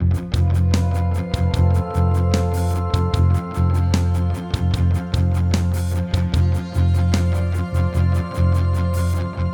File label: F Lydian Vamp